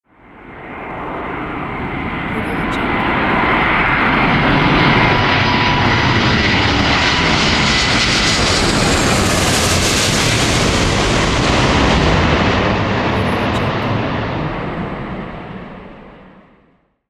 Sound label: boeing passing